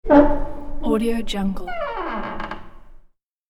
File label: wood door squeak